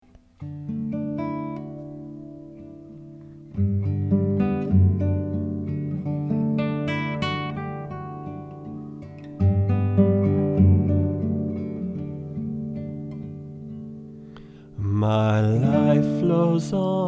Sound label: Singing